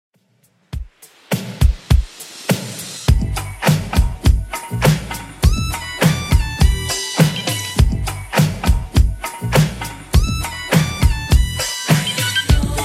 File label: 90s Redrum 102bpm